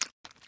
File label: lobby victory; Smite; Sol